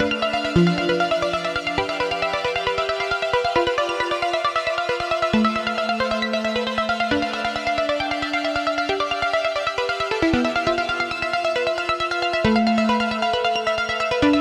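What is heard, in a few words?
Synth 135BPM A minor Melodic Filtered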